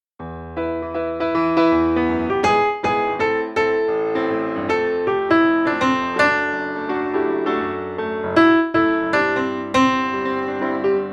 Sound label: Melody; Simba; FOLK